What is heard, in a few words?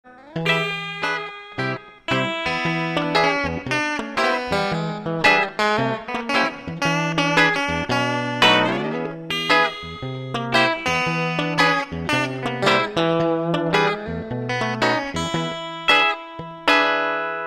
Loop